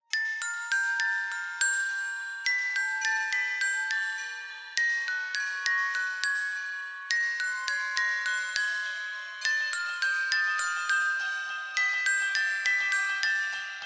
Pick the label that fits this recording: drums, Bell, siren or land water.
drums